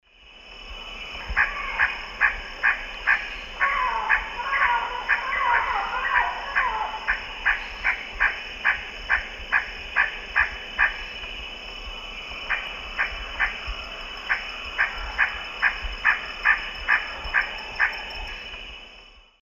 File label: Pteroglossus inscriptus, calls; S. America; Eastern Lowlands